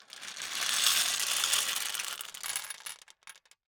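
Gumball machine, metal, glass, candy, flip, jostle, clatter, slow, wide; Eiravaein Works; Vaeyan IV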